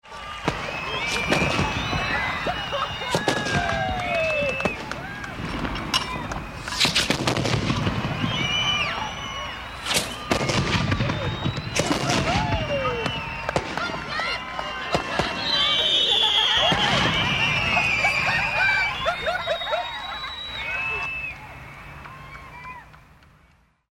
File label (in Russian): feyerwerk; noviygod